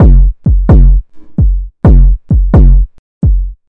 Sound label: 130 bpm gabbery slow kicks